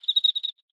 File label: Env Void Insect Sing